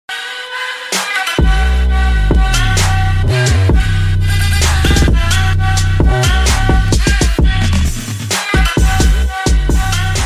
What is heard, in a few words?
trap ringtone